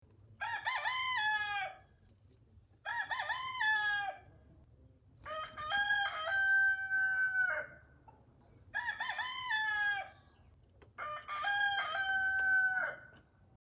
kukareku dalek

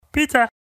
picca golos

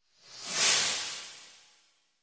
zvuk ischeznoveniya

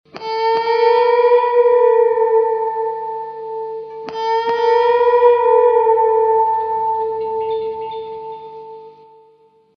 zvuk priblijeniya opasnosti gitara

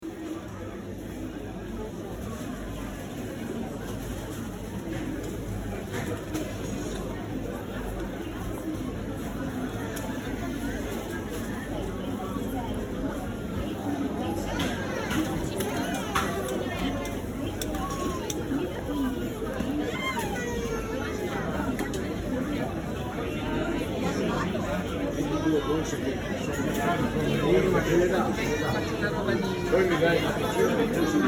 rynok

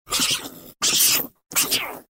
zvuk agresivnoi krysy

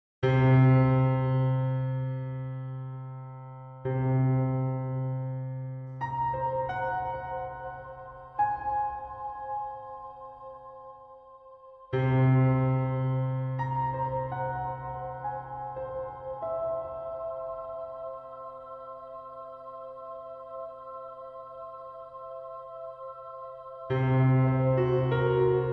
dlya jutkogo momenta na pianino muzyka

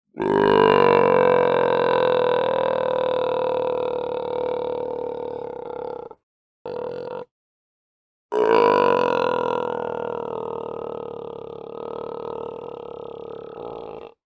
morskoj lev

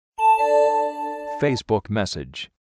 sms golos; facebook

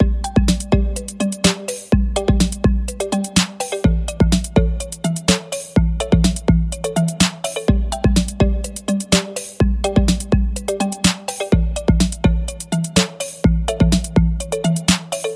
muzyka dlya igr

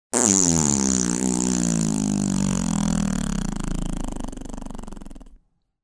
puk cheloveka; Издавание человеком неприличных, но естественных звуков портящих воздух

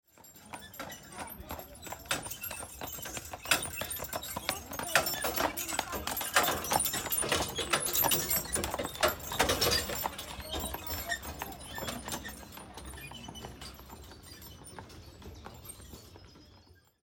konnaia povozka

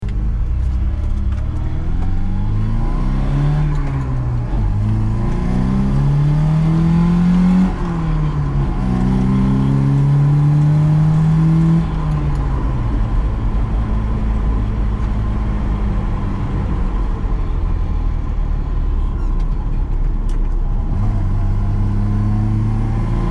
zvuk avtomobilia zhyguli lada

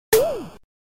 pryjok

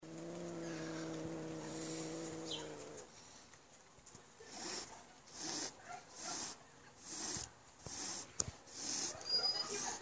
shypenie zlogo kotenka

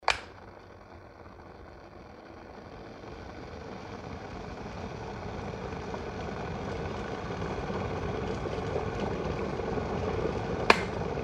vkl bystroe zakipanie i vykl el chainika

